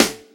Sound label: snare hybrid; Sonic Foundry (Sony Creative Software); Ugly Remnants VOL-2; Beatstuff